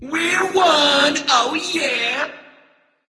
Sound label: nv yay; smite; voicepack; xbalanque